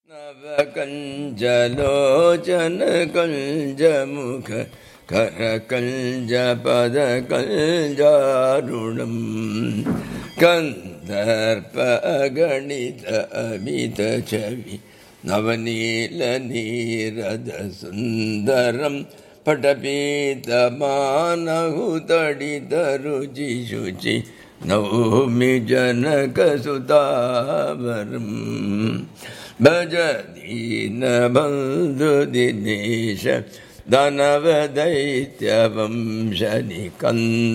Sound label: sings Ram Bhajan